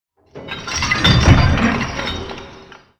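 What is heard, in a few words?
big chains sweetener close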